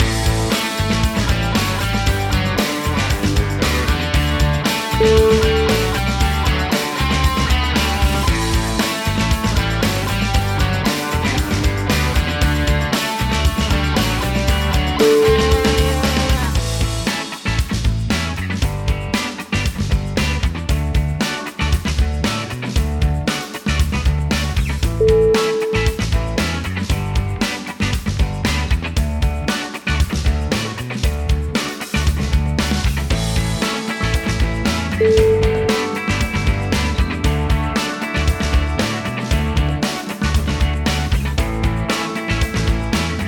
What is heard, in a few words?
pop rocks